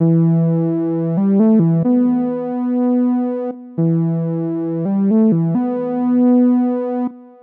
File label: flanger